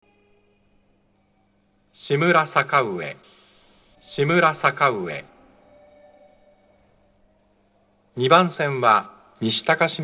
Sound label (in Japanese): shimura sakaue 2 bansen, subway